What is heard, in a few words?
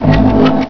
robot; Descent